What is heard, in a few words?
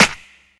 00s verby steel snare drum sample g key